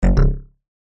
multimedia notification error buzzy fat

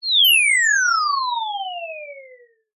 cartoon descend whistle fast high pitched; zapsplat

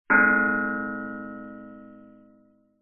bells buddhist chime ring

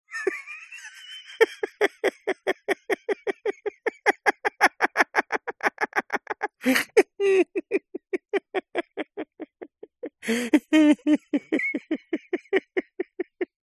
human male middle aged laugh hysterical; zapsplat

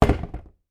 industrial leaf blower down on table

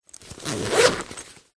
luggage camera bag zipper; zapsplat

